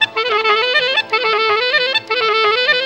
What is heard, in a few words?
CLARI GROOVE; Best Service; World Colours